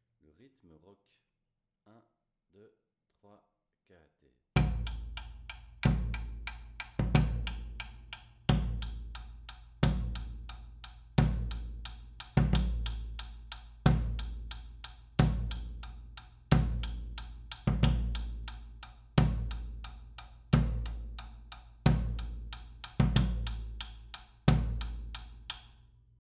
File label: Rock Bass, Batucada